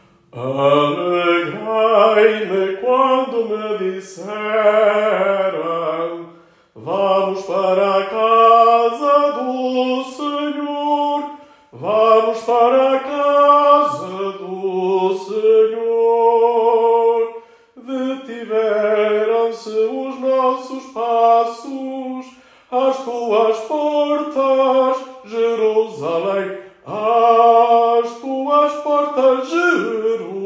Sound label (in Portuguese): Entrada estrofe1 tenor